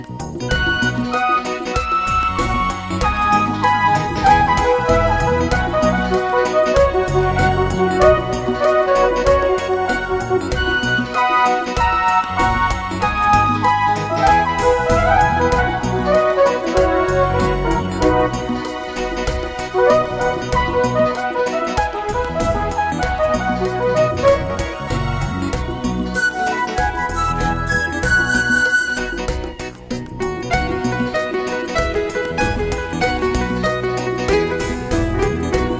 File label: Reggae for Me